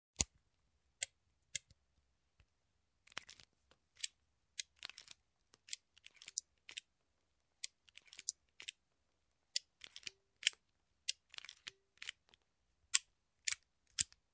Reloading revolver

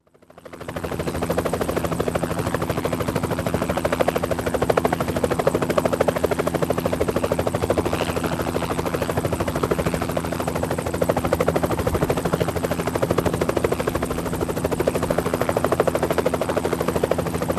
Helicopter on ground idle engine and shutdown

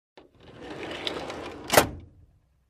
Drawer close slowly

